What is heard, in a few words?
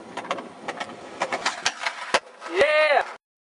nose slide